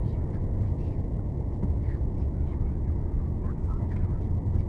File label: Arabic; flames